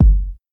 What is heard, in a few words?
00s low tone kickdrum sample g key, Royality free kick drum tuned to the G note. Loud